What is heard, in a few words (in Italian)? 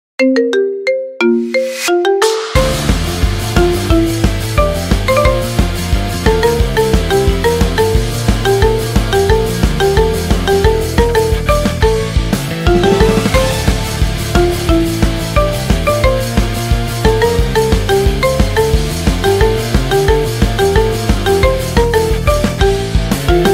Marimba suoneria